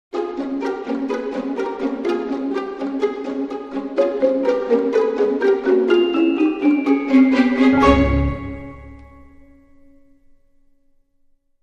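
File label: Dramedy Sting, No Percussion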